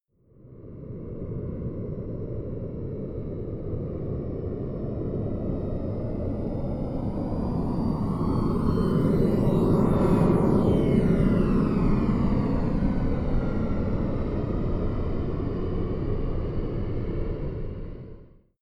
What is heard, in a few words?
plane honda private small flyby left to right distant